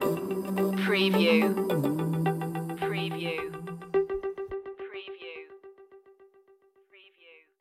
stinger

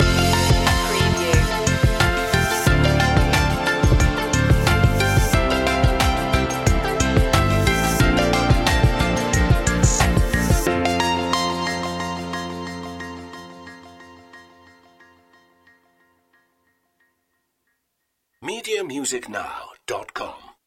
ocean waves loop end; media music now

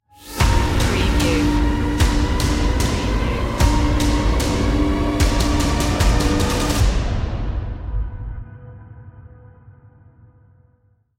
dark power stinger

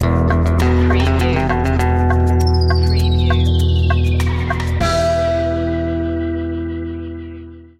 latin stinger

